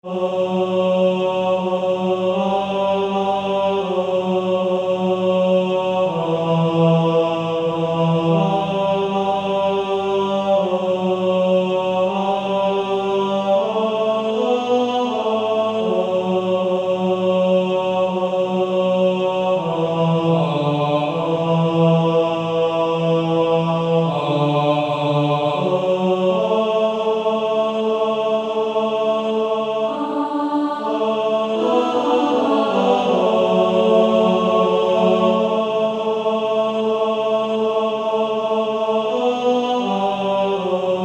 Male Choir T2; Low In The Grave He Lay

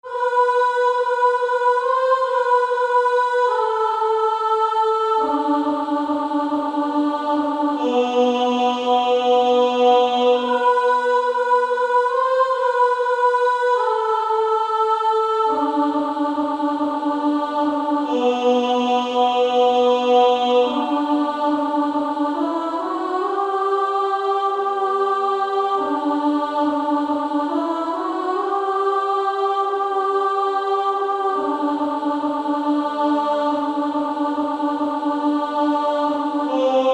Female Choir A1; 1st Alto; Wonderful Words of Life

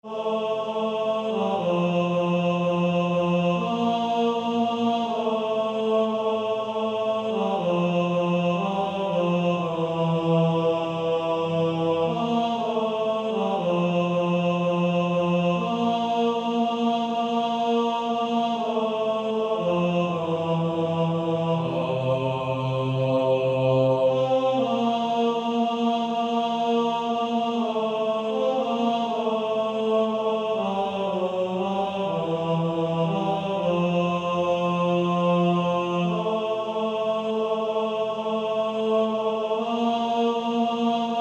Male Choir; He Hideth My Soul; 2nd Tenor

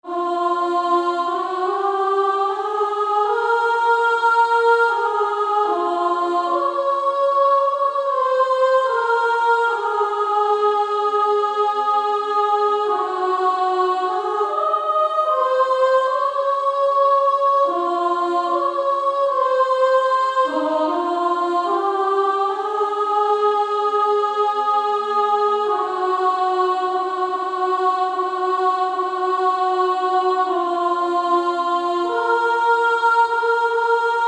Female Choir; Lead Me Gently Home Father

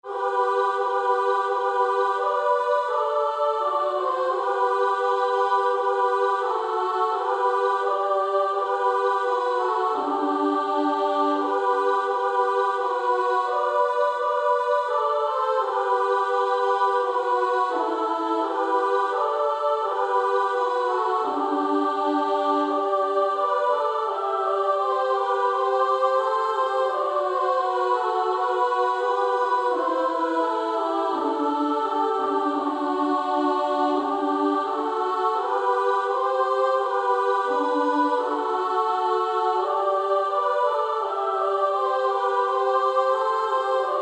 Female Choir SA

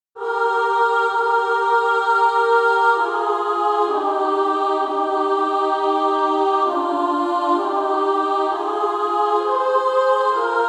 Female Choir

